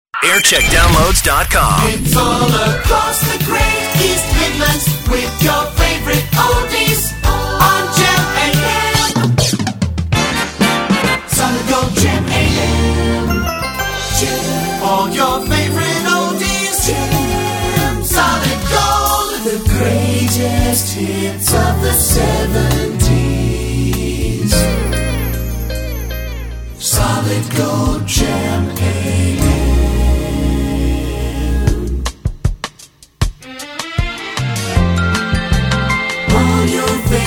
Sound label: jingle montage; gem am